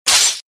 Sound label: Sfx Attack Hit; Sfx Baphomet Attack Hit